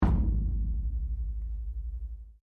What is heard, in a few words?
METAL THUMP QUIET